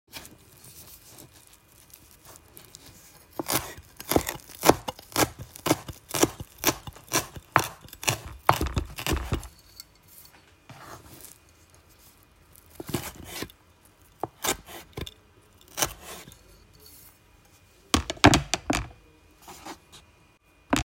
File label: cutting vegetables